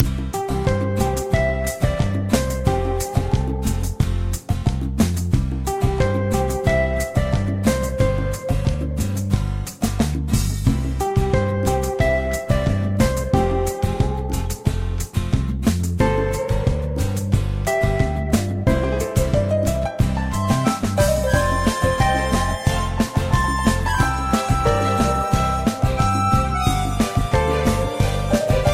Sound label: Back Music